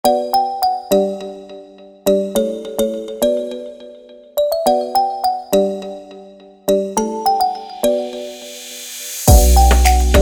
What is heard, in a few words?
Instrumental